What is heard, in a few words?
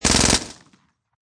Submachine Gun Uzi 9Mm Short Burst Close Perspective; LYN084 Guns & Military